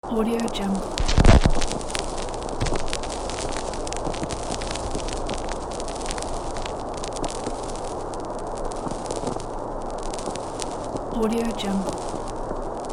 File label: noise vinyl